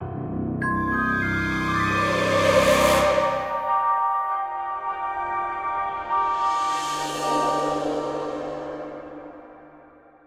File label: falling; roguebook